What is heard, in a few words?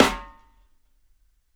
SNARE, DRUM N BASS; DRUM N BASS KIT 1